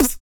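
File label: vox percussion, Vocal Planet Jazz FX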